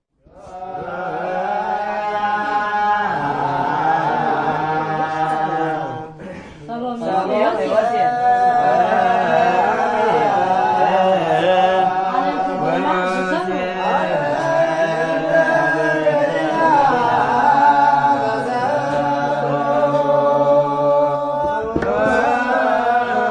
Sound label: christian monk chanting; MALE MISC and SPOKEN WORD